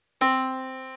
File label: yamaha Disk